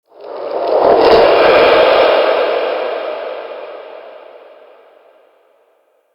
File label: Crypt Cold Texture, Ambience; Champion Crypt